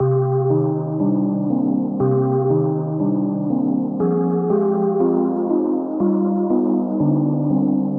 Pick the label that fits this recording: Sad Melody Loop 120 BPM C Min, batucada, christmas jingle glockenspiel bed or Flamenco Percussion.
Sad Melody Loop 120 BPM C Min